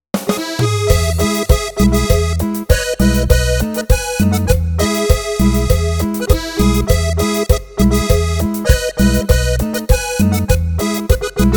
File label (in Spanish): Cumbia